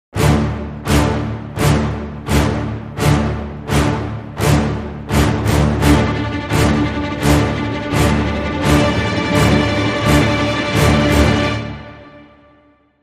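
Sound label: Dramatic Sting, No Percussion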